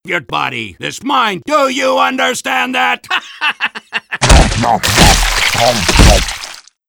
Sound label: win; painis cupcake